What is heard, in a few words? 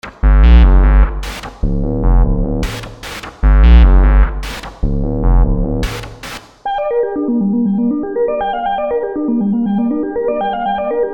HIP HOP Producer Sequenzes